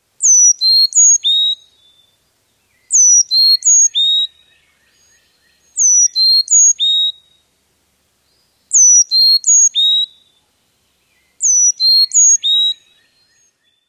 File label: four-note song